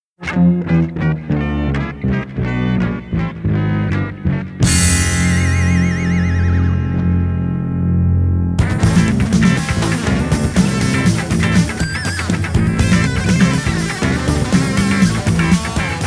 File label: Funk